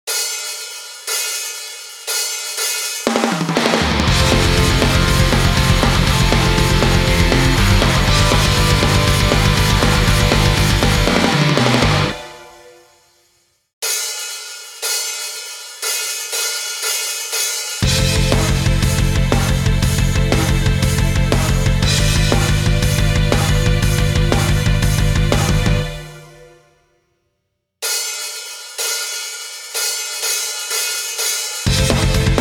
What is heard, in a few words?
karaoke